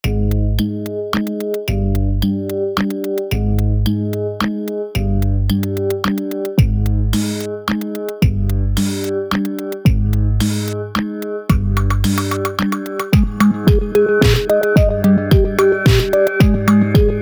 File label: Waltz; Vectronom